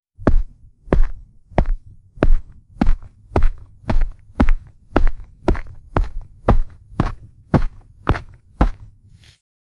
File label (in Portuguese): passos lentos e pesados, asphalt